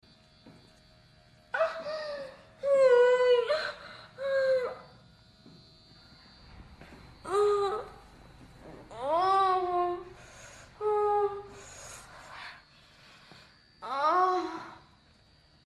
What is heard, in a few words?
moan, moans; The Witcher Hunt